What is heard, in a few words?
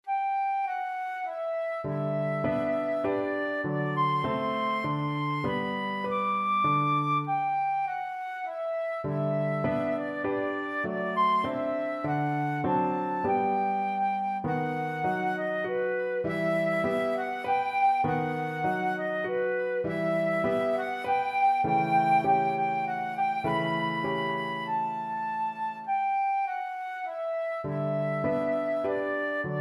chopin wish, flute